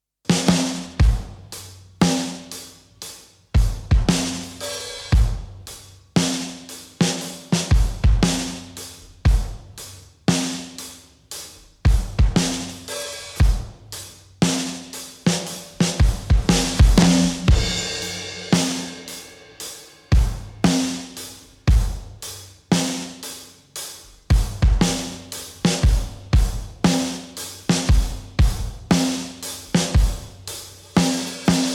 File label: drums no verb